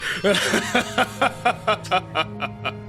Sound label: laugh